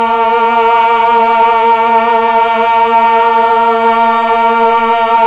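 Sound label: voice Mellotron choir, slow choir; Roland LCDP09 Keys of the 60s and 70s 1; Melotron Vox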